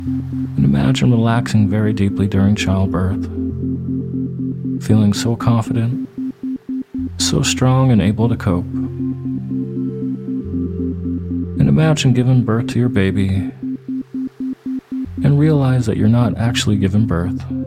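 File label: Relaxed Child Birth, Isochronic Tones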